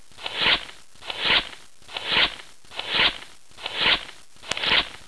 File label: Bird; 特酷声音素材库